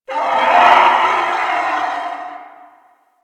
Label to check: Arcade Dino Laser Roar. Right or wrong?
Right